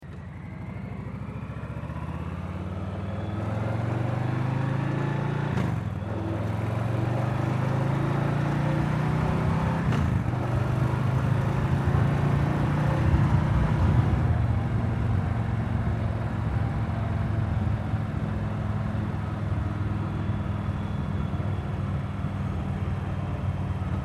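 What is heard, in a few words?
Stock Softail, motorcycle exhaust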